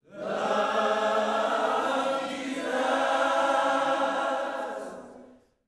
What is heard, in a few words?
LOVE JESUS, Vocal Planet Gospel; Spectrasonics; GOSP PHR